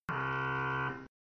deep Fail

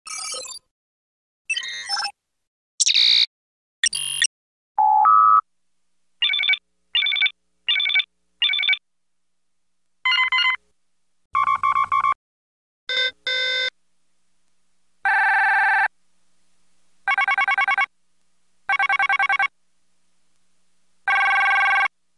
beep sound; star trek various tng

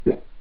turn; helpTheTrain

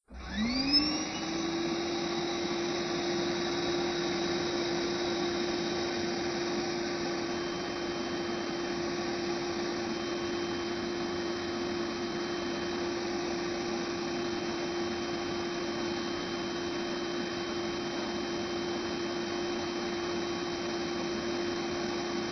low pitch shifted vacuum cleaner without piep

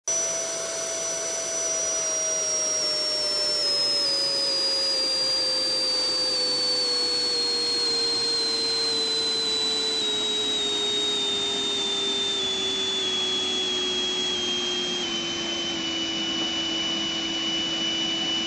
pitch lowering vacuum cleaner

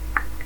low pitched click

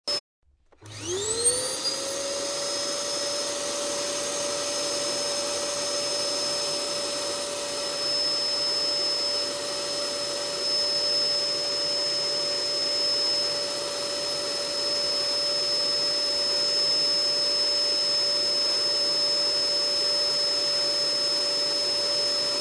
pitch shifted vacuum cleaner sound effect

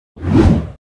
swoosh sound effect

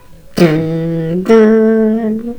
phase change loud; protectTheRoom

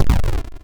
shot shot; protectTheRoom

